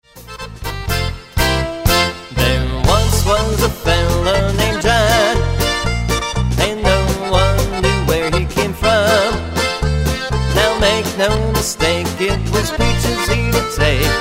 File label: polka